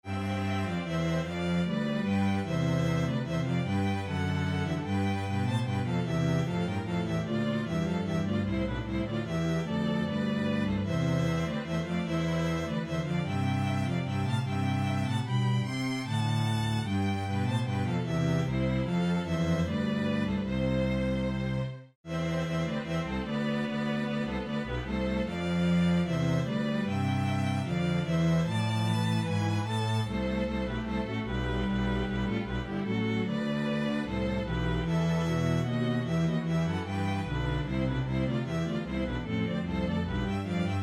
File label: Kyrie, string bass